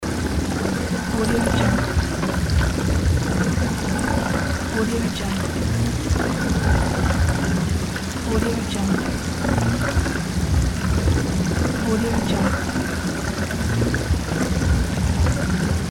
water mill

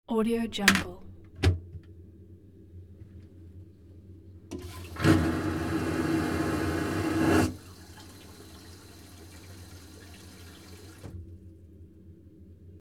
flush cruise ship toilet; sound ideas

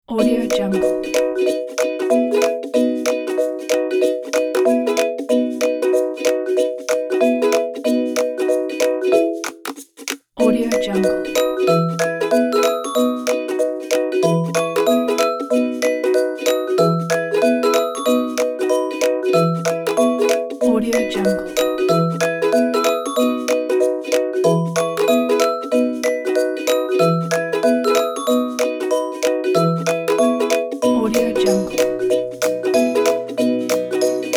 upbeat kids

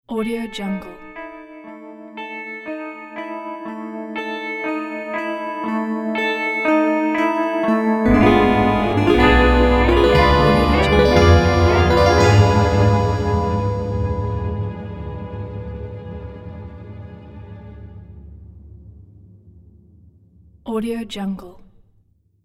creepy mystery ident

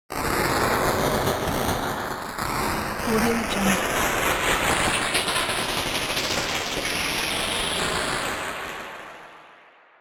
abstract noise scuttle

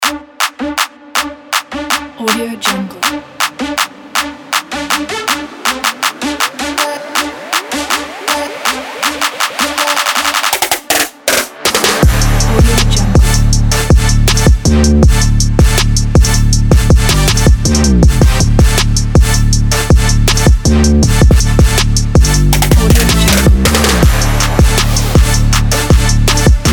for that trap

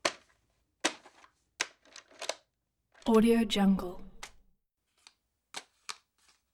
tv camera buttons pressing multiple clicks

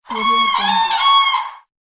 crane bird screech; fxprosound

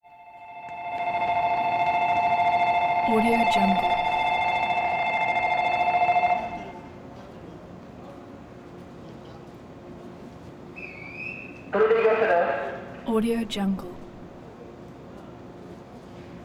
station ambience phone ringing; prosoundfx

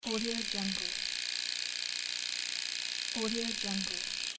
beard trimmer

